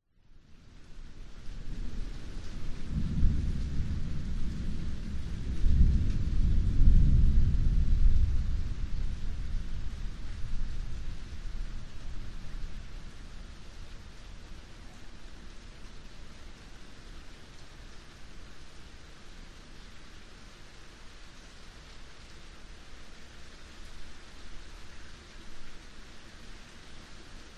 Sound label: nature thunderstorm distant rumbles very light rain drips yard; zapsplat